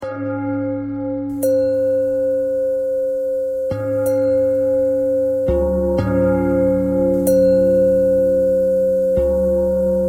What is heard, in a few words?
bell bell thread simple, bells